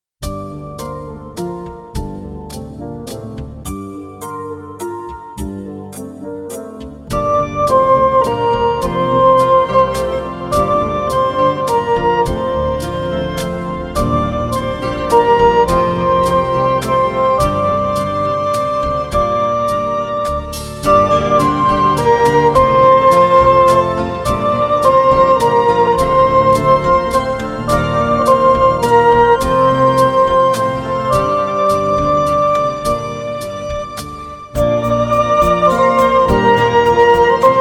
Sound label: Song of the Sea for Band (melody)